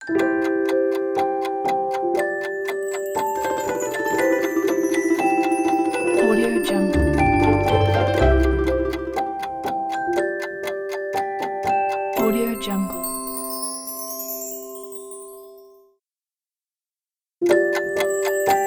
sleeping time kids tv ident

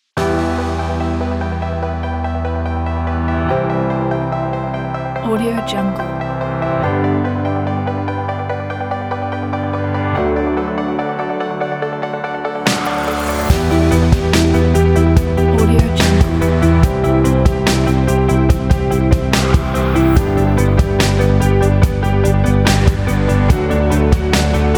inspiring future

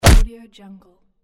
stamp

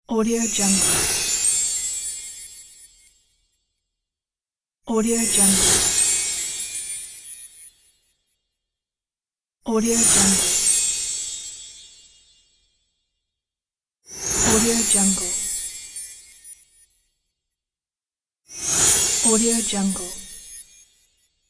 magic chimes

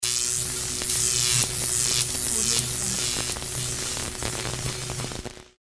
electric spark

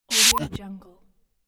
quirky glitch sweep

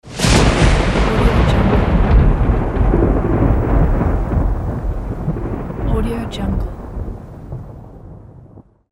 thunder

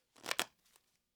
deck of cards; prosoundfx

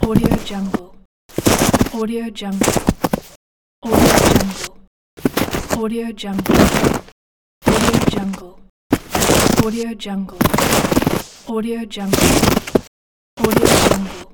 snow steps

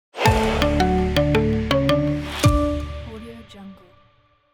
simple logo

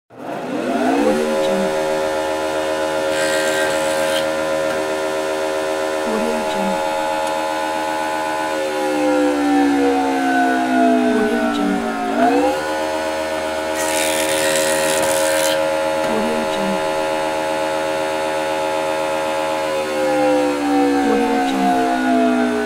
thicknesser machine big

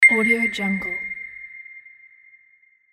ding

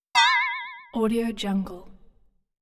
comic pulsating metal hits

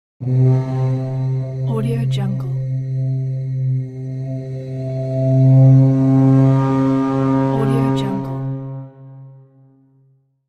war horn

